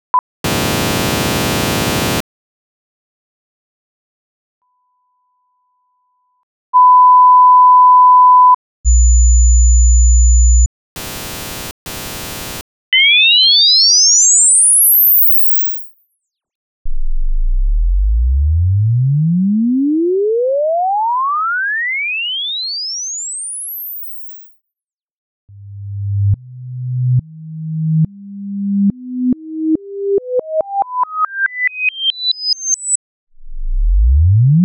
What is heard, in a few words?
Test signal silence intro; 96 kHz 24-bit; rmaa